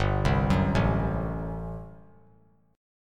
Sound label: G Major 7th Suspended 2nd Suspended 4th; GM7sus2sus4 arpeggiated; 7,7,9,7